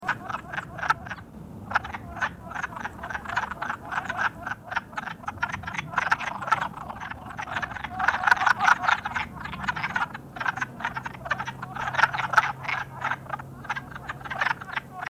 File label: wood frog